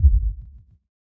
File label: Kick; BlissedOutBeats; Touch Loops; Drums